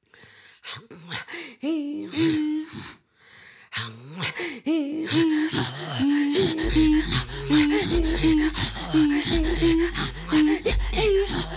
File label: Mosquito, mother and daughter throatsinging